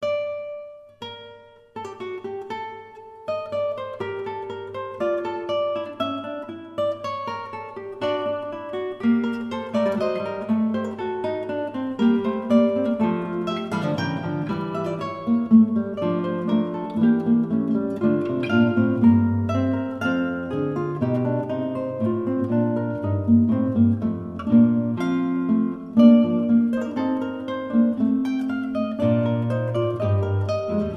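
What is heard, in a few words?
ensemble fugue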